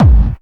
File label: hardcore 1, bassdrum; Ueberschall; Techno Trance Essentials; DRUMS